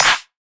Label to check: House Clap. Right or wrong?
Right